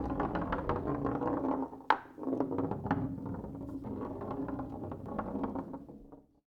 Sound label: Foosball Ball Roll